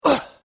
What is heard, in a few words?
Pain Umph, Male Painful Grunt, Hurt; human sound effects; Lynne Music